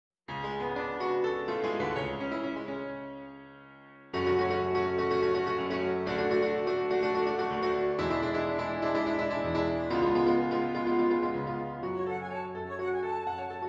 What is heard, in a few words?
Flute and Piano; The Legend of Zelda Ocarina of Time